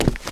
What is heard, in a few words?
Footstep Run Base; starbites